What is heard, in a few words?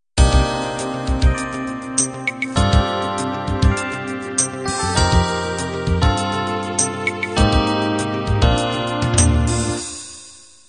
slow ballad intro